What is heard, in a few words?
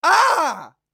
Scream